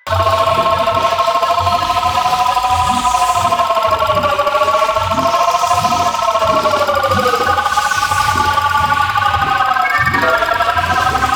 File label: Med Station Loop; Warframe; Lasrian; Lasrian Soldiers; Weapons